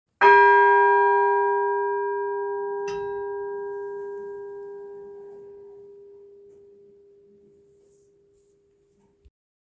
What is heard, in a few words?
bells of sussex; balcombe